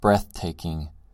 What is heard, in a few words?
breath taking